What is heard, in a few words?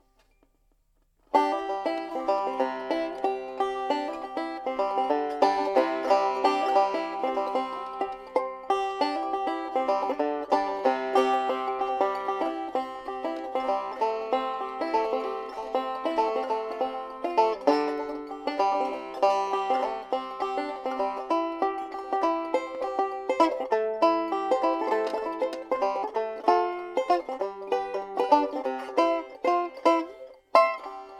pick